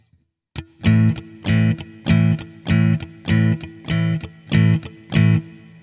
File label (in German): Schlagmuster Übung, gitarre